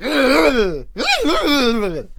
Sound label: Cannon Attack Vocals